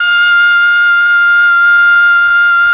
OBOE; ILIO; Propeller Island Legendary M400; CHOR+OBOE

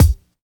BD; Best Service; Dance Mega Drums

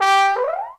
TROM DOIKS

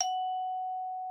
XYLS F#5, VIBES; Xsample VOL-4

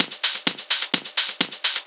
Clubhouse Loop 128 BPM

